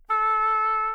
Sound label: oboe original